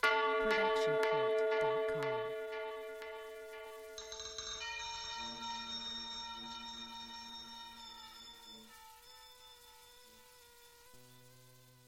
scary bells

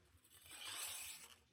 archery foley arrow pullback

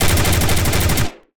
Automatic Weapon Fire

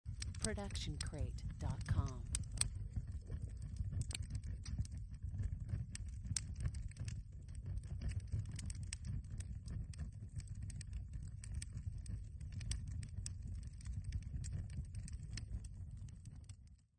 Indoor Fire; SoundsCrate

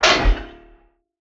metal impact; soundscrate

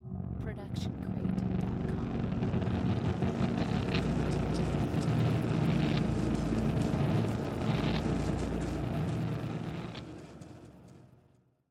distorted chaos; SoundsCrate

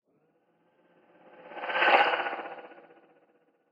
scifi fly by

